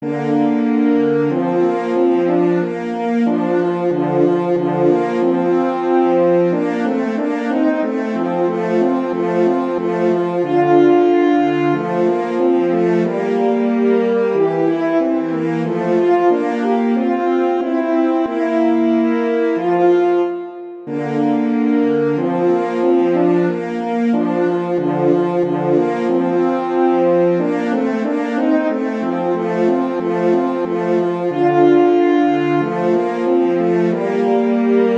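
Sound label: Duet, horn; Mozart; Duets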